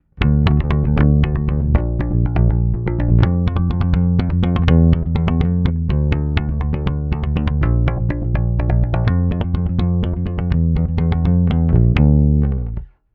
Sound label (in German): Billigbass Flatwounds dry